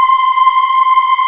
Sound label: Aahh Chor, VOICE; Best Service Gigapack 1